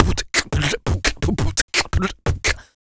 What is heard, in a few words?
Human Beat Box, Drum & Bass; Loopmasters